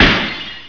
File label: Smash; IQ2 Demo